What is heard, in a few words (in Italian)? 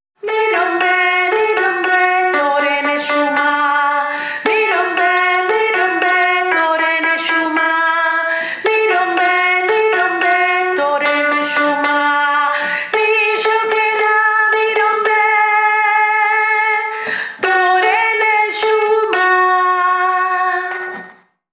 MELODIA; MIROMBE